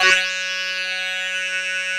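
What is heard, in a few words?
synth Flange talk; Best Service Gigapack 1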